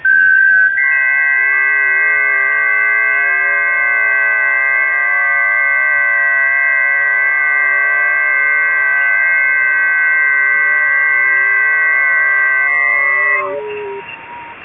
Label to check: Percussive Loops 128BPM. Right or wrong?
Wrong